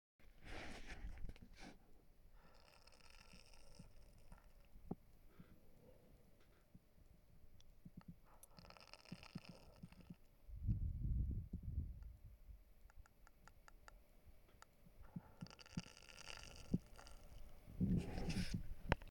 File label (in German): knistern, Hals knistert beim einatmen; Forum für Medizin - Symptome - Beschwerden